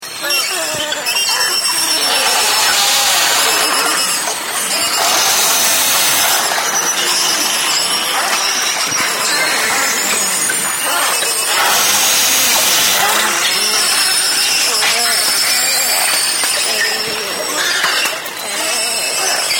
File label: beluga